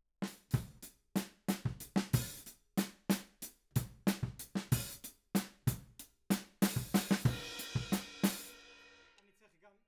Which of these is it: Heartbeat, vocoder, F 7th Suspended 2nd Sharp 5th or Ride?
Ride